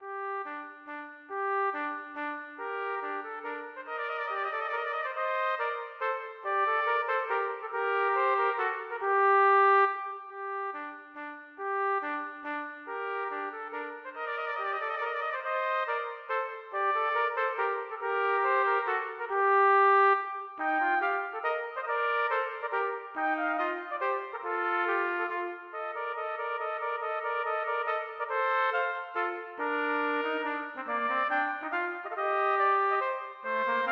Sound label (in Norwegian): Menuet for trompetduett; Aamodt